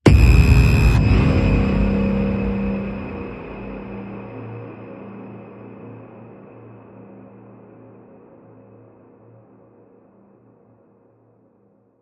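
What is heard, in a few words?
Blast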